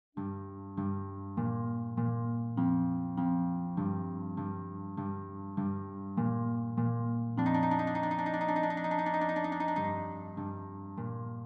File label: basstanz, lute